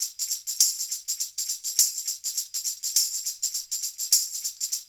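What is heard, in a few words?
loop, tambourines; InVision Interactive; Percussion Slam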